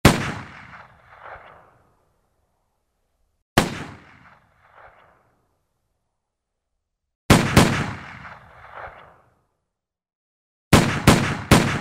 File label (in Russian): zvuk vystrela iz pistoleta